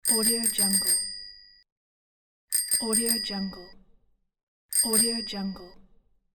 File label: bicycle bell; mrsoundfx